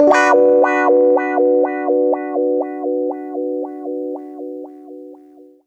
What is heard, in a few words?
120 WAH PAD, Electric & Acoustic Guitar Loops; USB Soundscan vol.04; AKAI